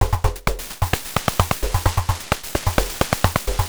Beat, Industrial

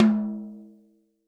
TOM, Real Drum Kit

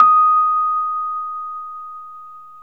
Rhodes, D#; 3D Sound; 3D Keys VOL-1

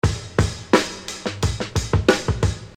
Beat, Hip-Hop Street Beats

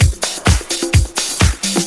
Latin Loop 128 BPM

